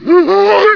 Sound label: zombie voice idle; Half Life 2